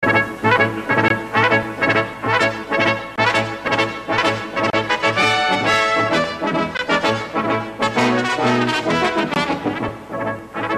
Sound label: Brass